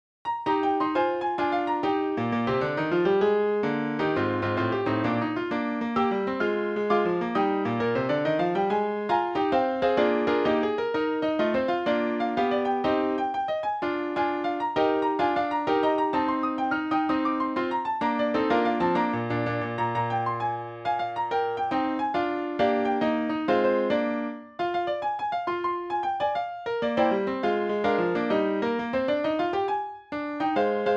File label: quintet finale piano; reicha